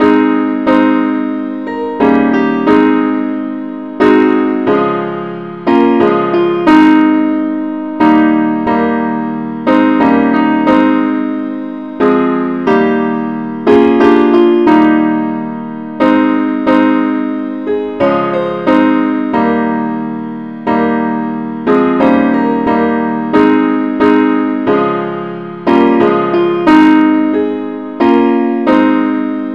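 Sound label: simple accompaniment, key A flat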